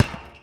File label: Street Ball Metal Back